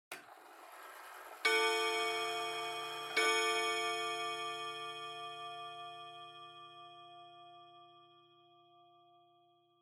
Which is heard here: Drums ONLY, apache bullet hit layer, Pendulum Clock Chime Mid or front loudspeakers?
Pendulum Clock Chime Mid